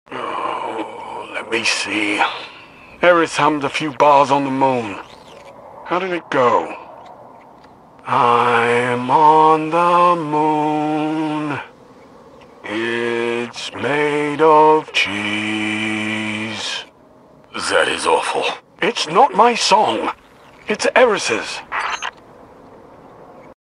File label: Singing; Shaxx